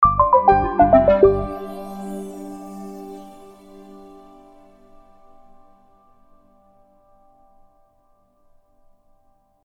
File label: Sound Logo